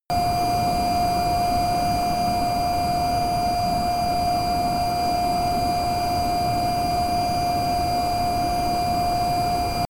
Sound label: military jet rafale turbine idle direct